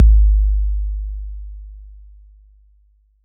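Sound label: bass drum 808 Decay E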